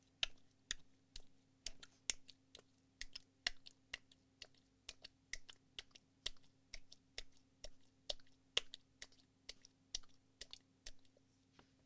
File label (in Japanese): ぱんぱん; ASMR